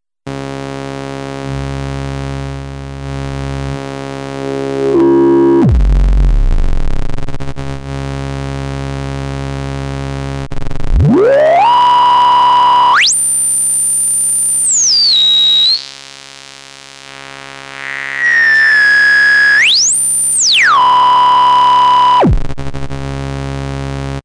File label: highpass, nanozwerg; MFB nanozwerg